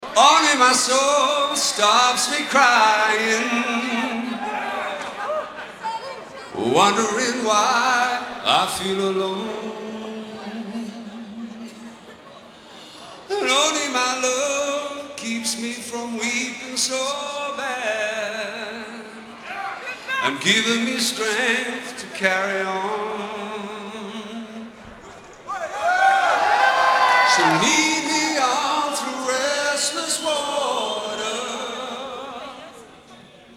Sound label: Acapella, Live